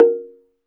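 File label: SLITDRM A#4 F, slit drum; SLIT DRM1; Heart of Africa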